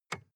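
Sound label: sports car interior lightswitch soft; verkehr transport; autos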